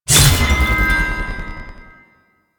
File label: Plunge Ability Cast; Enemies; Abilities; Warframe; Duviri; Duviri Dax; Dax Knight